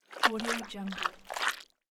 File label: gut squish